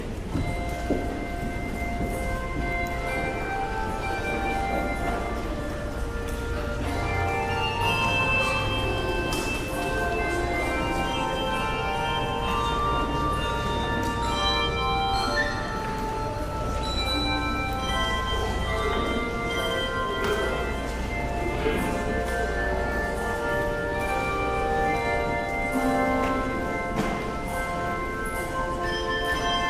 Handbells

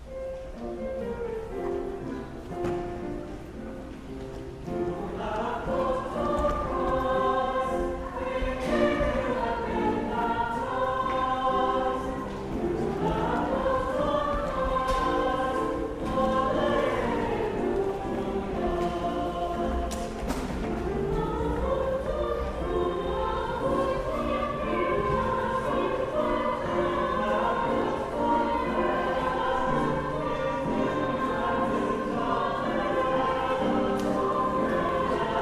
Choral

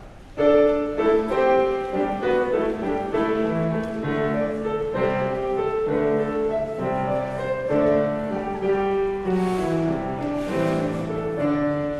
Choral Sing Aloud

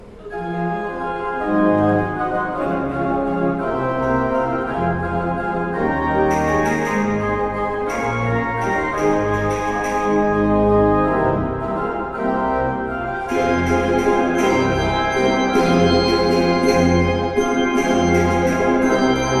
handbell rehearsal; Holy Spirit the Dove Sent from Heaven

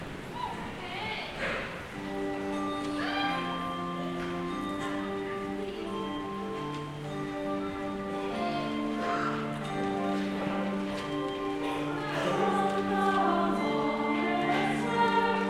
Choral

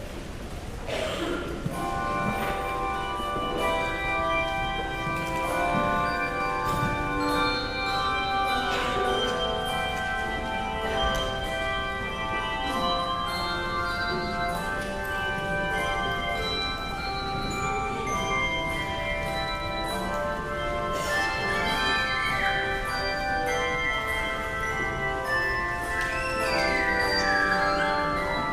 Handbells; When I Survey; Maundy Thursday

